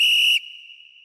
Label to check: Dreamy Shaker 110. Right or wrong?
Wrong